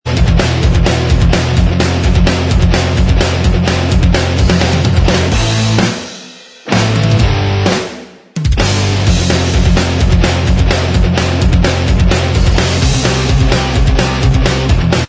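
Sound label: punked up instrumental track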